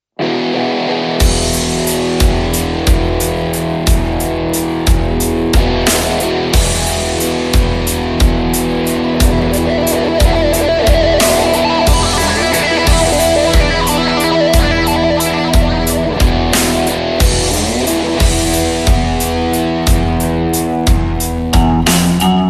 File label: Korg Pa3X